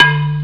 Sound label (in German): bauen